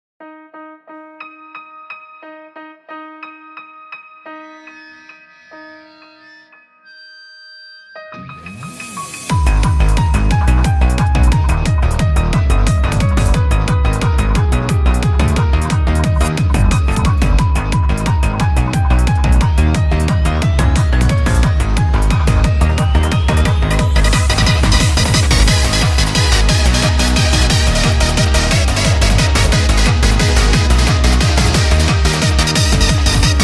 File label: Nu Rave